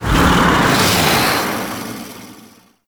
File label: Flight Whoosh; Warframe; Teralyst; Ropalolyst